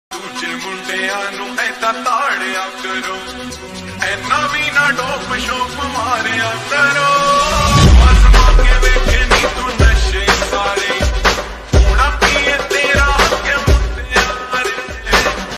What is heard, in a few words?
lofi remix hindi ringtone